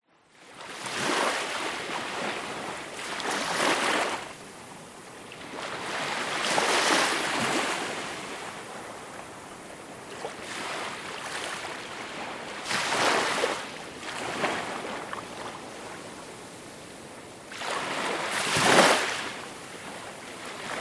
nature ocean waves small calm gentle lap onto sand beach tropical island